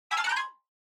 Scene Change Short Dry

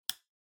PC Hardware LAN Plug In

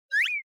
Anime Motion High

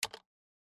PC Keyboard Space

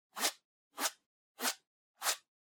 Tablet PC Swipe

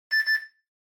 Washer Front Load Beep Short

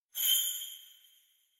Kagura Suzu

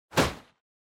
Motion Grab Strong; モーション 掴む; 強

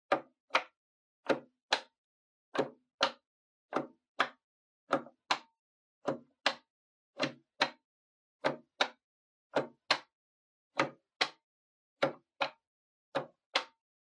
Public Phone Button Single Gentle

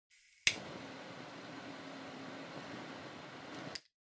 Lighter Click Jet

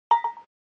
SNES Golf Ball Hole

